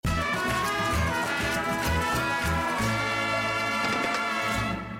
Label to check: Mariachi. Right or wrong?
Right